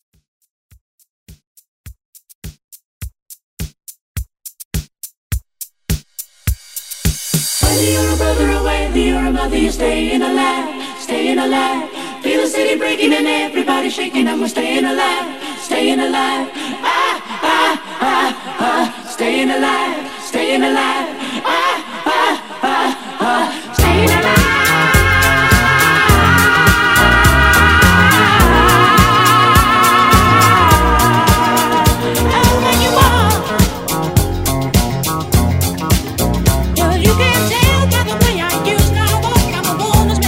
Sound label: 70s Acapella Intro Drop